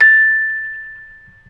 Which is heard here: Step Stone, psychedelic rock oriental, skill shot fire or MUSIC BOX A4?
MUSIC BOX A4